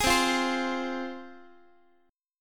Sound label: C-Suspended 4th Sharp 5th C down Guitar; Csus4#5 strummed; JGuitar